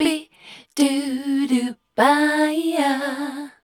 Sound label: MEL D#, Voice Spectral